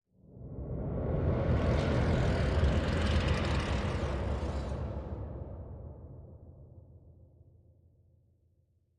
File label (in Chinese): 绯红森林氛围声; ambient